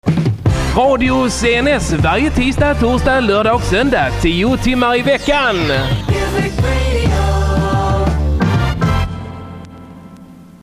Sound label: jingle